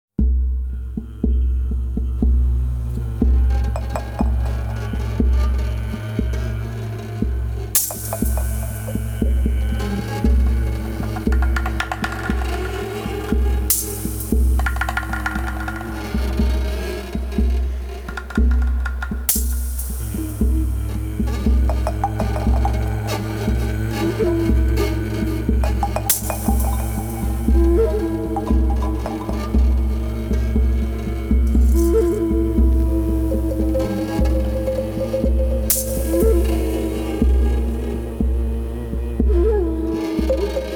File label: America - War - Tribal Tension; Civilization V